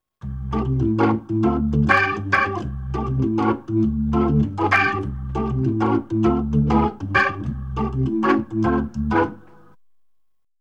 B3 Organ; Masterbits CLIMAX 8

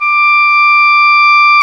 oboe; Roland LCDP04 Orchestral Winds; Oboe Brth 1

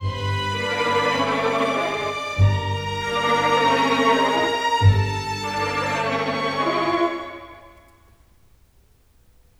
Cinematic Strings, Orchestral; Sonic Foundry (Sony Creative Software); Orchestral Series VOL-3; Cinematic 16

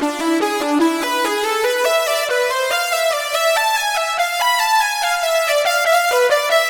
Modal Climb slow, Keyboards

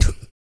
EH REGGAE, Vocal, Blues Soul; Spectrasonics Vocal Planet

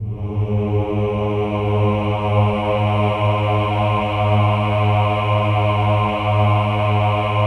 OH-AH G#2, choir; Spectrasonics; Symphony of Voices

